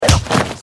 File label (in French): Coup de poing